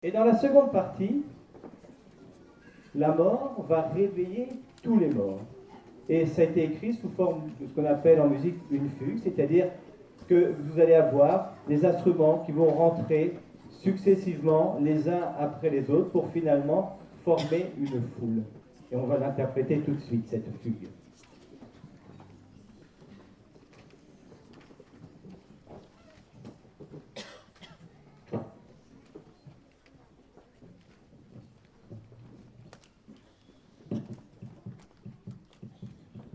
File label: fugue